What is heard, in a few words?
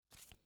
Black Jack Card Enter